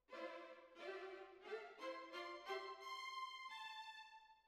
Cellos Over

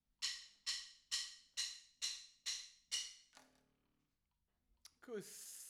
TB GTR

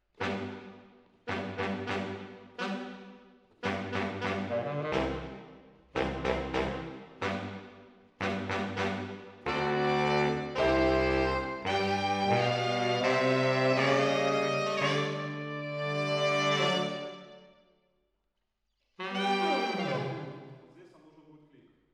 MKH AB, piano

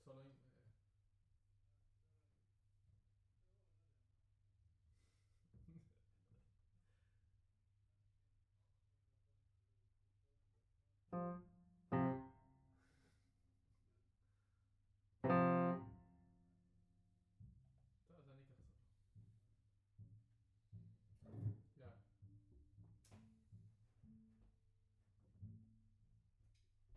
Piano B

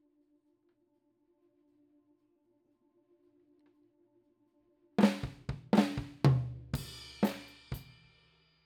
KIT

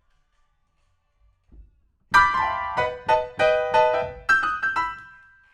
PIANO, RNR for piano

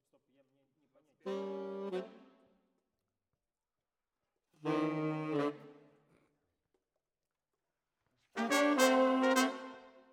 Sax

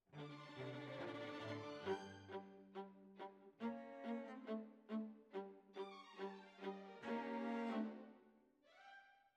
Violas, jazz for piano

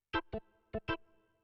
Keys

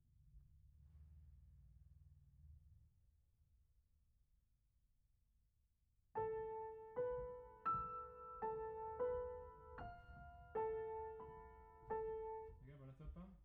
Piano Mono

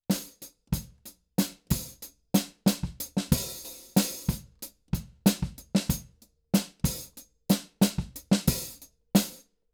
KIT

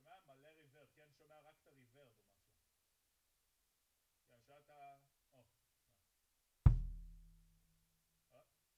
BD Out

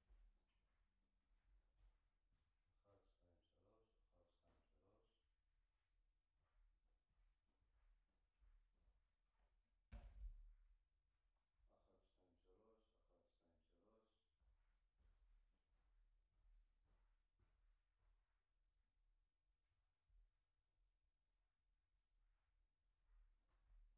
PERC

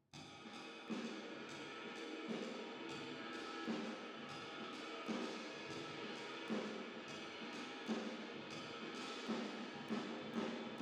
Drums Verb

